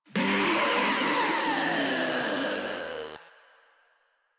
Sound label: Infested Ancient Death; Warframe; Proto Infested